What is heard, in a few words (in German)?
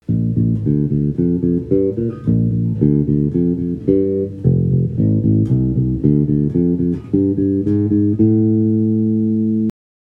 Aufnahme zupfen